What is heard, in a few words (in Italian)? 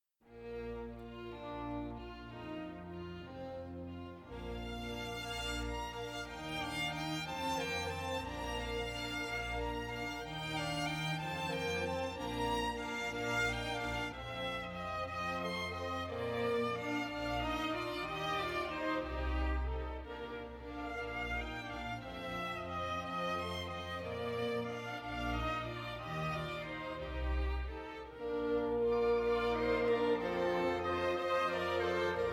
Sinfonia in Pastorale andante